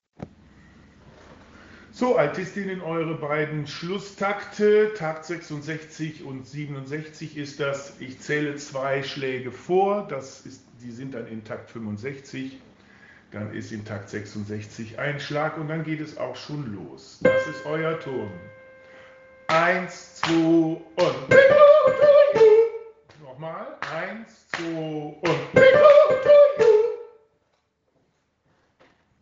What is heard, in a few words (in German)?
BN Alt atempo